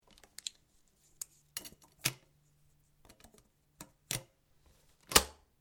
SHOTGUN BAIKAL 12 GAUGE DOUBLE BARREL LOAD SEQUENCE STEREO; A selection of domesttic firearms; Shotguns